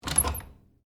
Door Knob Turn, Foley, Door, Knob, Turn, Hard, Wood, Bathroom; Miscellaneous Door